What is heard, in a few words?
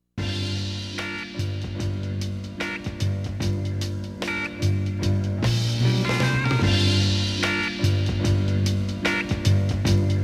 organ grinder interlude